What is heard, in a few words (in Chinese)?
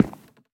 樱花木踩踏声